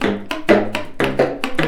sax cles

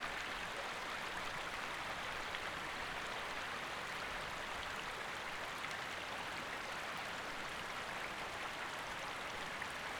ruisseau fond vent grondant

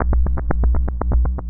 boucle grave

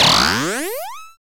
glis grain

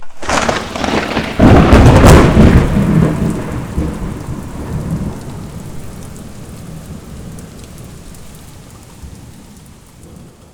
tonnerre

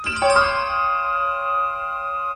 objet composite

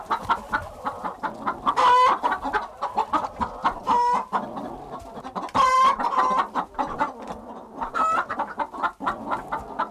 poules

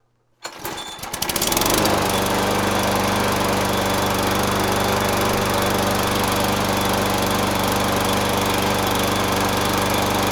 tondeuse

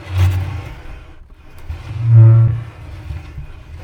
vieux tiroir

